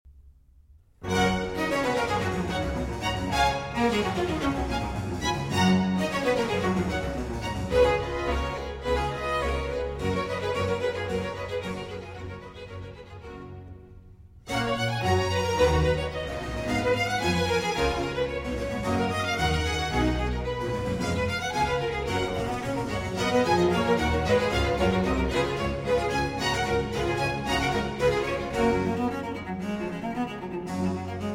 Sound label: Cello Concerto in G, Allegro; Vivaldi; RV413